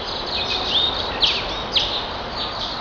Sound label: Bird